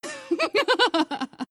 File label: pain laugh; dota2; queenofpain